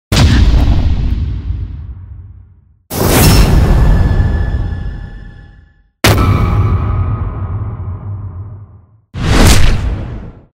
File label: parried